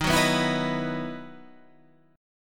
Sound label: E Major 7th Suspended 2nd Eb